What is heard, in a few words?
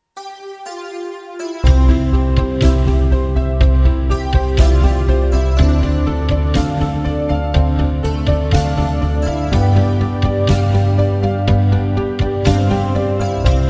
Synth Variation; BWV846